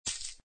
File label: gem collect